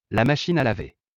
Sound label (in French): machine a laver